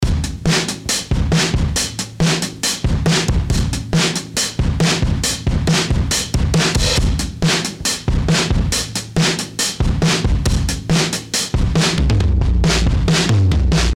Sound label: Drums lots Devil Loc